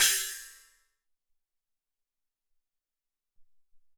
Chna Cym, Alesis SR-18

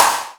snare; HipHop Kick Snr Hat; PlatinumPhattCD1; E-MU Producer Series Vol. 8 – Platinum Phatt

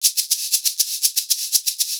120 SHAK, Percussion Loops; USB Soundscan vol.36; AKAI; SHAKER

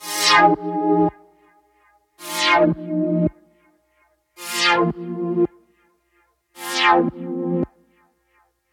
110 Fm Chillwave Chords, Melodic Loops; Electronisounds

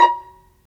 Pizz, String; Vlas Pizz; Vas Pizz; Roland - String Master Series

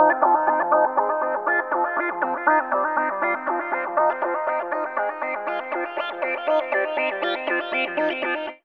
trippy sequence F 120; Trippy Seq 3; Trance Formation; Sequences

